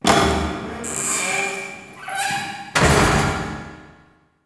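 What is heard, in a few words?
large door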